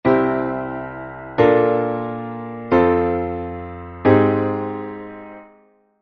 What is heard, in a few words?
C nondiatonic